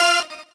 normal hit normal

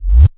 Wind Scratch

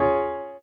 Synth; osu

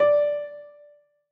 Piani D

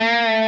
Bend half Release

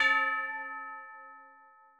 A5 bell

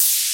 finish short

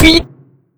normal hit clap; osu

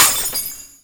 breaking

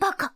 combo break; osu

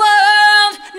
vocal

